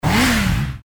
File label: motorbike passing